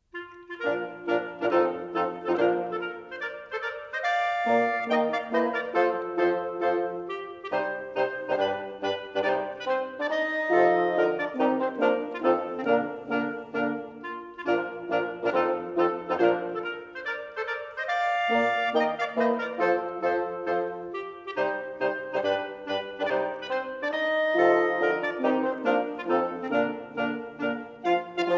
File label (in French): Marche pour sextuor; Ludwig van Beethoven; en si bemol majeur